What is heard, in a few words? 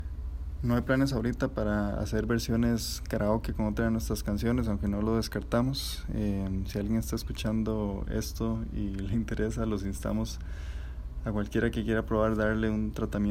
karaoke